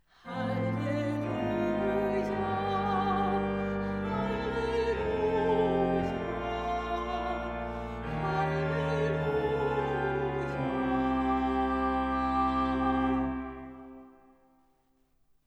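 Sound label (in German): Liturgie Halleluja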